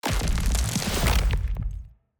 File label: Earth Sphere Boost; TANK; 3Souls